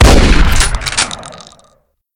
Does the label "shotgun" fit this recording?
Yes